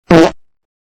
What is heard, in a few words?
Dry Fart